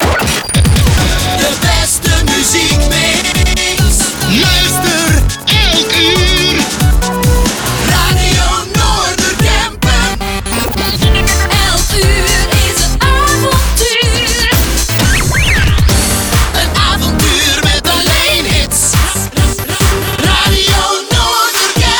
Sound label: Jingles